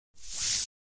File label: Soda Fizz, Household